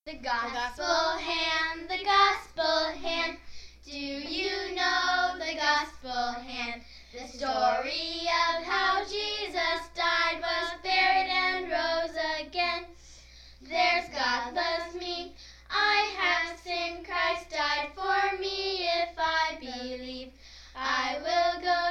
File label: gospel hand song